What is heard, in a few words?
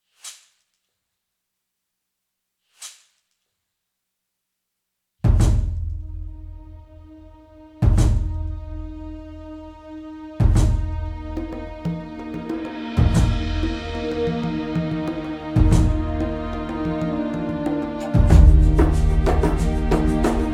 Instrumental